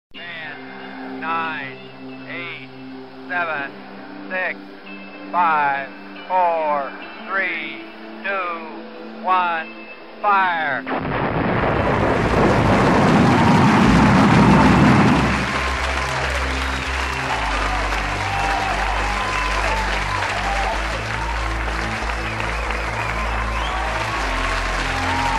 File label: spacex launch; mixdown